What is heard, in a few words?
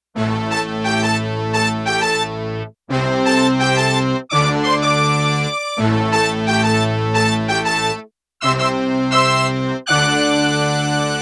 Brass Tutti; Digital Synthesizer